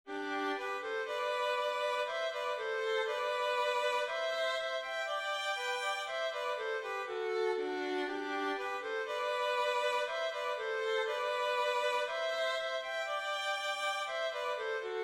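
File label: melody in G with violin 3rds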